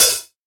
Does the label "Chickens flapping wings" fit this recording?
No